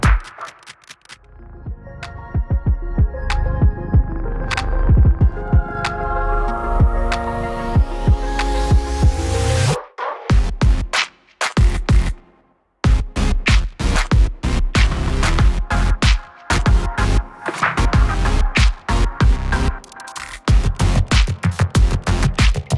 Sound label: Battle theme; witchbottle; WrestleQuest